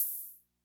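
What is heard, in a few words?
Open Hi-Hat, rhythm330; classic machines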